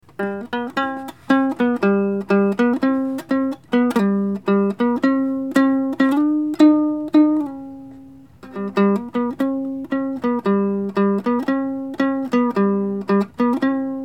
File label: Uke Intro